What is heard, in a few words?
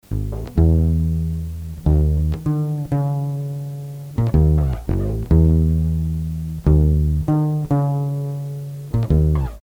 KALA LINE